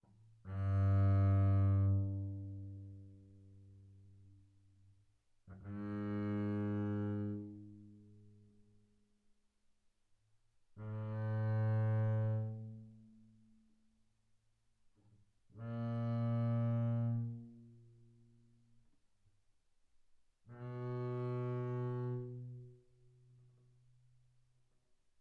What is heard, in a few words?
Bass arco sul G mf G2 B2, double bass